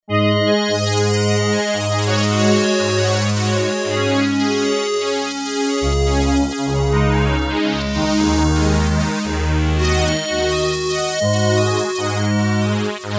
Warp

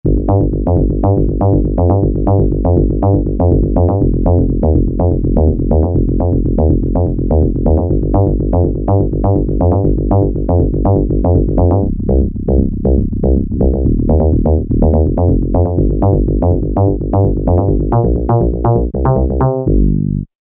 Synth Pluck, Peavey DPM Spectrum Bass

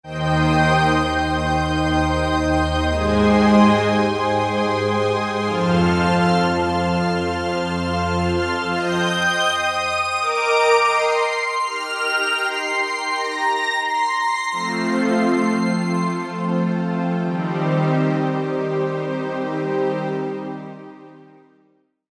JP-8 Str

